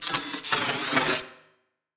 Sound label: build